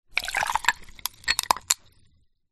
food drink spirit or liqueur pour glass ice cracks